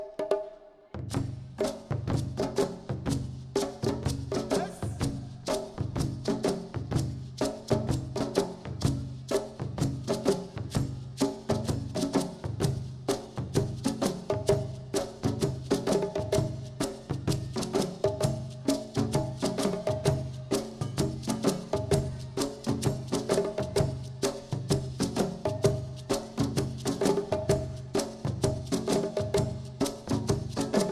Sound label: drum Song; Arabic; World Music